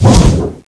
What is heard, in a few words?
zbs skill swing; cstrike; Frozen Terror